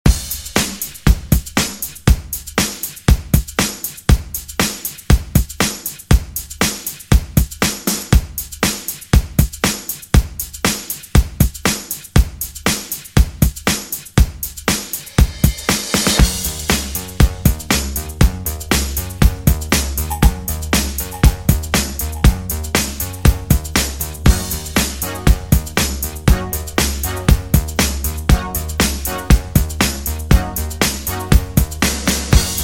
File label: Pop 80s, 119 Bpm